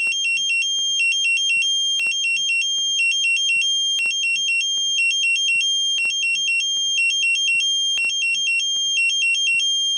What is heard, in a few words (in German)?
Kleinalarm